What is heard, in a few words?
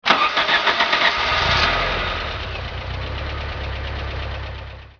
CAR; Canon Notejet III